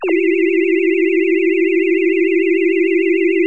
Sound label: sci fi; Masterbits - Switch FX